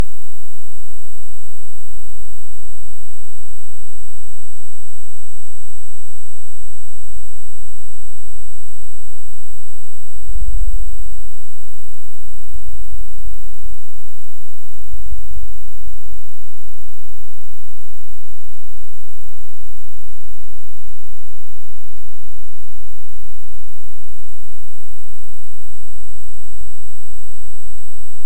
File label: Hydrophone; OneOcean